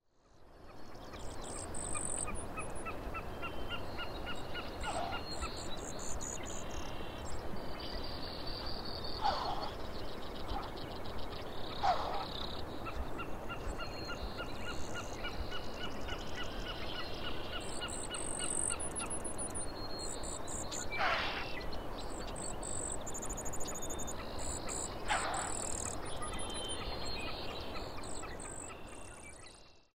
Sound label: dismal swamp